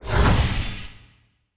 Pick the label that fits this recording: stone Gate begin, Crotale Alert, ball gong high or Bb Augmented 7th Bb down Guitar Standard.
stone Gate begin